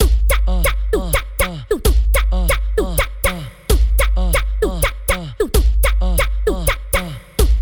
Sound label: Beat